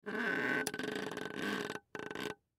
Straw Move Squeak, Straw Move Squeak Cup Lid; Drink Fountain Soda Pop Fast Food; Miscellaneous Bar; foley; Blastwave

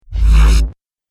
Laser Energy Bolt, laser gun

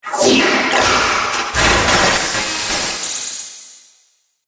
Starship Short Circuit Cannon; Spacecraft Sound Effects

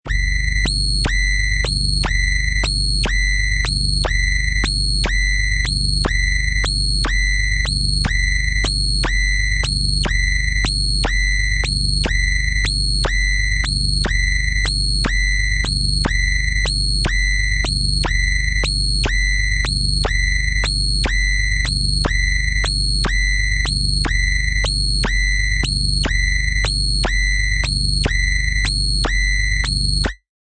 Alien Warning, Alien warning sound; Scary Tones; Sound Effects:Horror

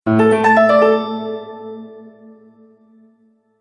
Bright Melody Mobile App; Musical

